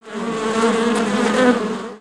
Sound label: Jungle Insects; gnarled forest